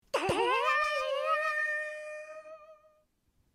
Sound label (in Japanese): 魔法のステッキ; 魔法棒; 声マネキング; 声マネキング対決; フブみこさん; ホロライブ; さくらみこ